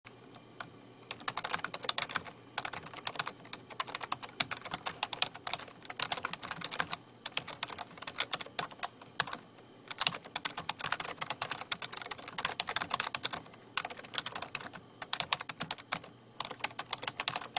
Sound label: typing